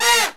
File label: POP BRASS